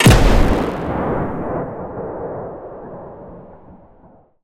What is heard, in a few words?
steampunk weapon cannon shot; BluezoneCorp; Steampunk Weapon And Textures